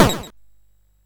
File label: Dark Castle, CSG8580R5